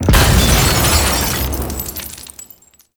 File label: Cylinder Glass Break; Ambience; Gameplay; Warframe; Corpus Railjack; Comet Shredder